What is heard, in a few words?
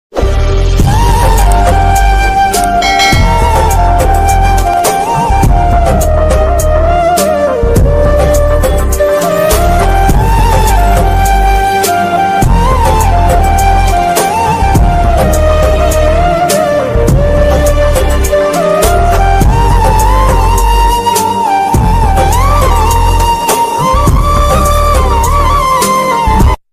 pakistani movie ringtone song